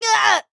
AHurt

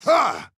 Attack Medium

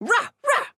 Medium

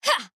Attack Small; Arya

